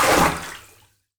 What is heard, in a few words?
Splash, household rhythm samples hits